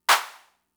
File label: Clap, Fricke MFB-522, Drum Machines